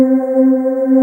VOICE JD800; Best Service Gigapack 1

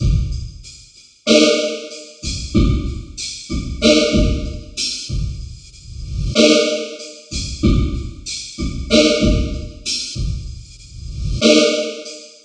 94 REVERB, Dark Side Of TripHop; 94 REVERBS

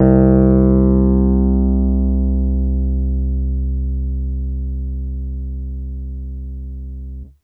Piano Wurl c1 med; TrashLibrary

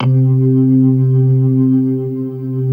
HAMMOND; Jazzistic

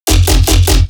bass hit room tone F; Virtual Riot

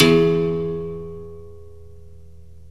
BERIMBAU; OMI - Universe of Sounds

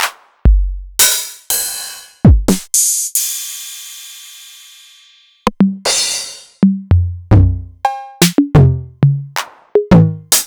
Novation Drumstation

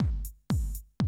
120, Classic Drum Loops

